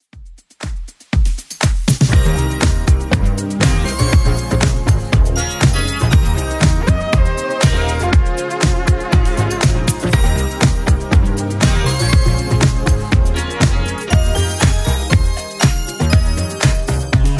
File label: 80s Club Edit 120bpm